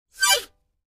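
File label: Mouth Organ Short Fast, harmonica; woodwinds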